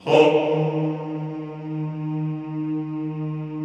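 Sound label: Vohmm Houuu, Chorus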